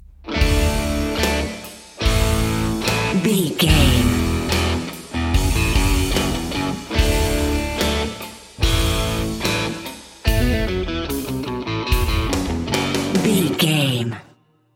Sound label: big game rock bumper